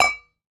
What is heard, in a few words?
Ball Collision Holiday Mug Med